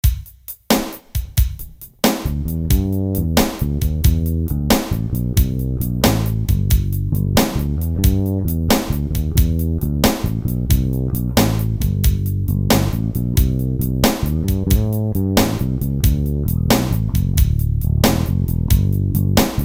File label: groove